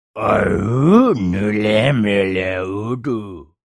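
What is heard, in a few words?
sounds of goblin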